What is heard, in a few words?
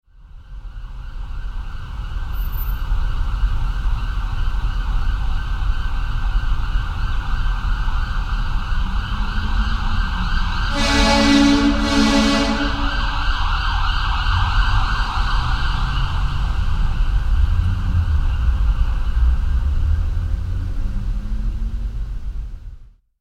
ambulance intro